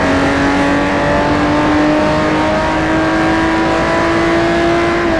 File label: third, vehicles; 512tr